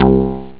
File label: Bass; SynthSamples2